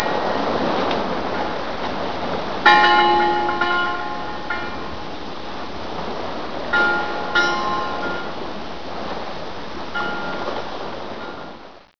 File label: bell buoy